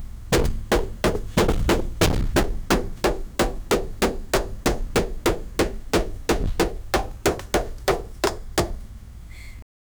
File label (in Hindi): ek aadmi bandar ko maar, violence